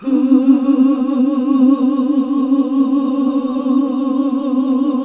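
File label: Gospel; Best Service Gigapack 1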